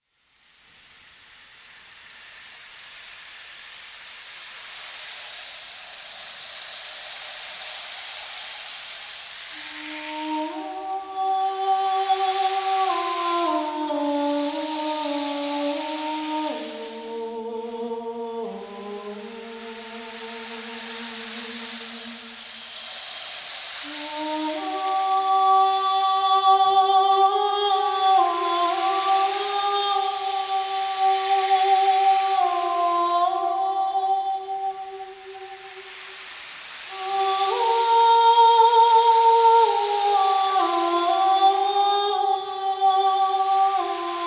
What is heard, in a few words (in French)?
Voix et bâton de pluie